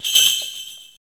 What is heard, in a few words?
SLEIGH BELL; Zero-G Ambient 1; PERCUSSION